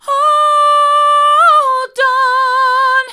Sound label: hold on hard, vocal